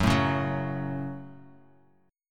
F sharp Minor F sharp, Chord; strummed; JGuitar

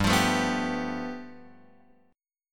G 9th; G9 Chord {3 X 3 2 0 3} strummed

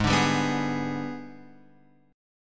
Ab Minor Major 7th Flat 5th, down, Guitar, Open F; AbmM7b5; strummed

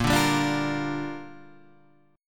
A sharp 6th, Guitar Drop D

